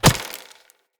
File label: Bow Arrow Impact Vegetation; Weapon Impacts; Player; Bows; Environment; Hunter; Envoy Hunter Bow